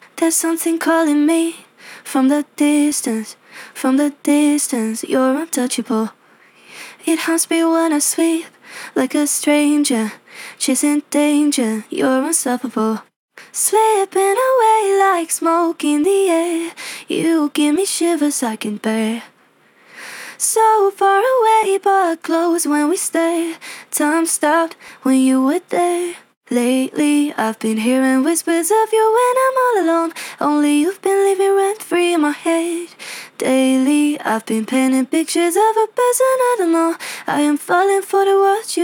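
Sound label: Acapella 140 BPM A Minor Lead (Dry); Vocal Loops; Acapellas; Lately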